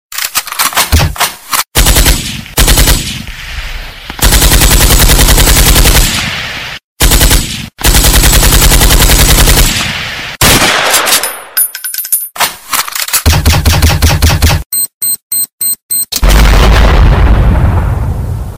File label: War Shooting